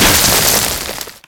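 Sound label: destruction bag beans; Explosions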